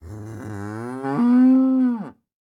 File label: cow voice; anemoi